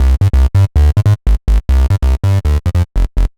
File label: Bass, uk garage, 142bpm; Szeet